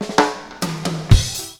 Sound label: FILL SD, Ragga & Dub Reggae